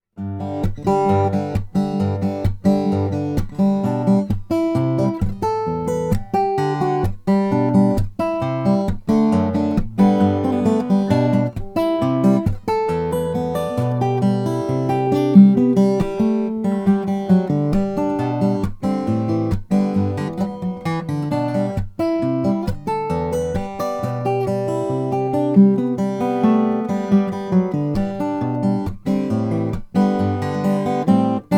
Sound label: Lowden f50 mastergrade koa